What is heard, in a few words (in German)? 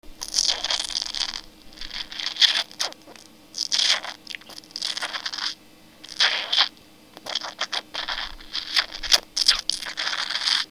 Schlurp